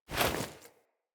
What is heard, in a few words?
Female Foley Action Default Melee Heavy Swipe; Player; Female Default Clothing